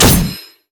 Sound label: rampage; LoL; hecarim